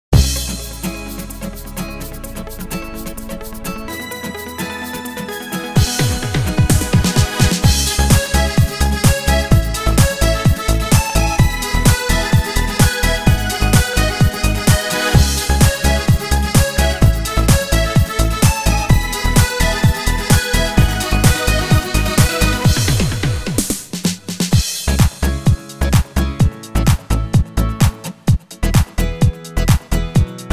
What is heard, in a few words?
Disco